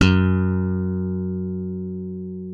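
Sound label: left thumb F#2, slap thumb; Xsample VOL-1; 90 s Sample CDs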